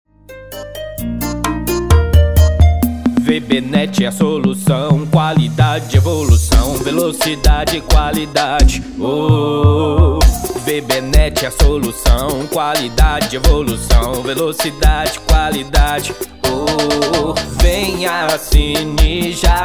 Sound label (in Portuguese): Jingles Para Ineternet Musica Para Provedor De Internet; Vc Net Malvadão